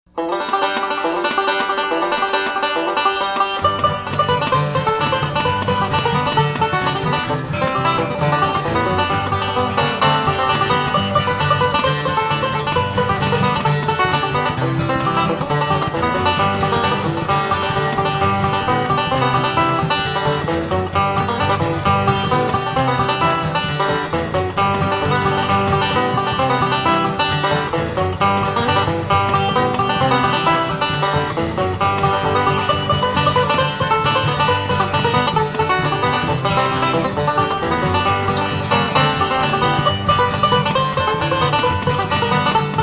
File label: banjo; signal